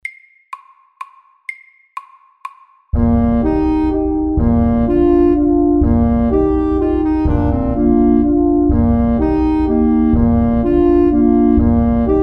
Elegant vals FAST 125

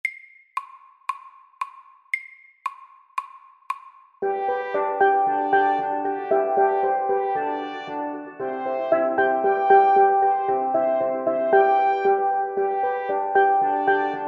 Steel drum band MIDDELS; Bugle horn

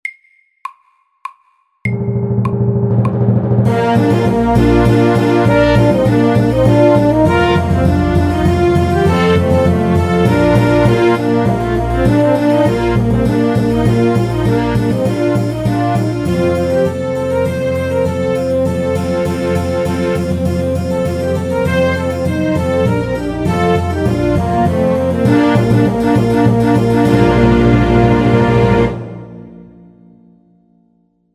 Air de trompette FORT

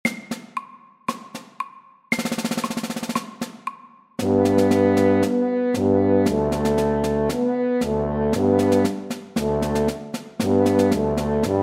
Kryssmarsj FORT

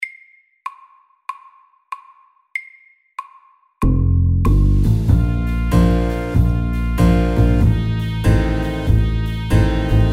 Godteri SLOW 95

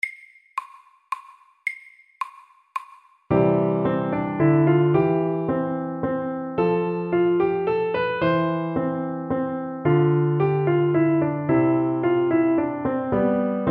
Menuett i G dur MIDDELS

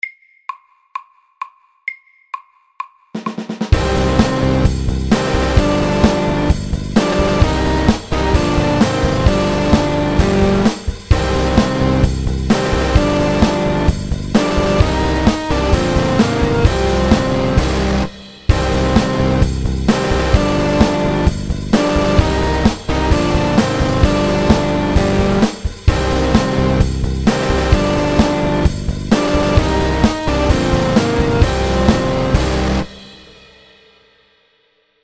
Triplet metal FORT

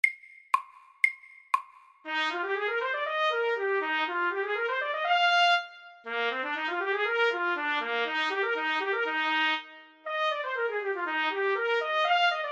Etyde i F dur MIDDELS

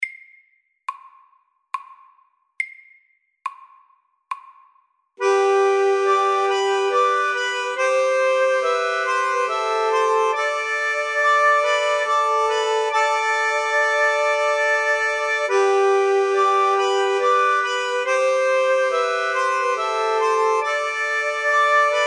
Musette SAKTE

